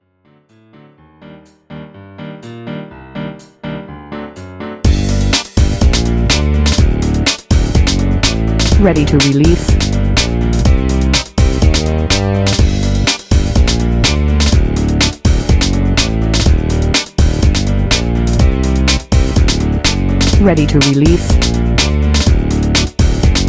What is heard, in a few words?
samba